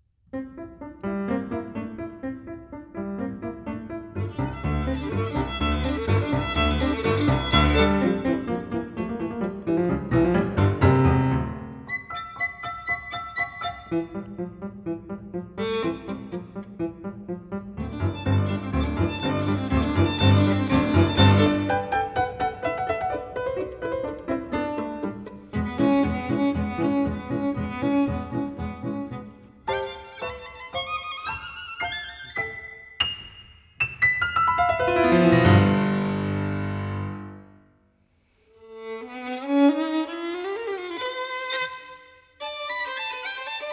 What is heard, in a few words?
Violon et Piano; Erik Satie; Choses vues a droite et a gauche (sans lunettes); Fantaisie musculaire